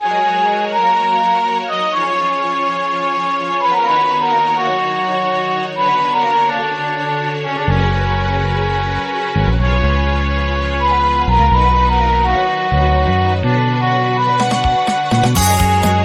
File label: Credits With SFX; SRBXmas